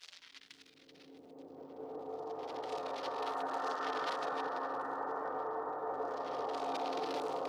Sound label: ambient loop